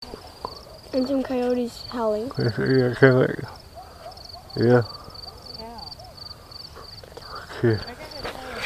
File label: a dog